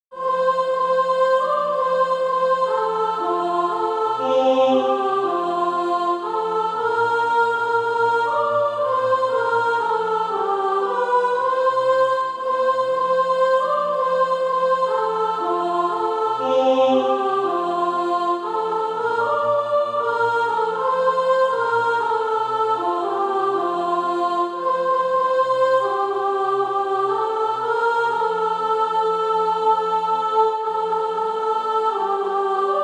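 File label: soprano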